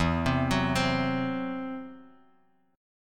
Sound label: E Suspended 2nd Sharp 5th E, Guitar, Open F; 4,7,6,7,x,x; arpeggiated; JGuitar